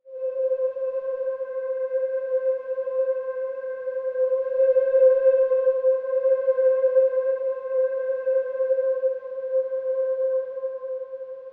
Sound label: PAD (C); One Shots; Meta; Cymatics; Mystery Pack Vol 4